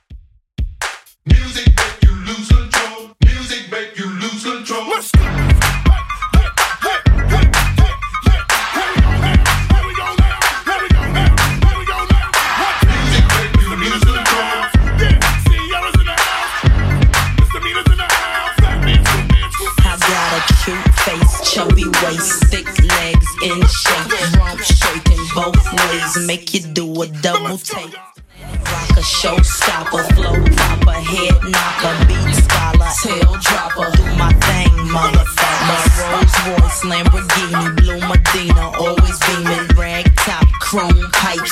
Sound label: 2ks DJ Friendly Redrum 125bpm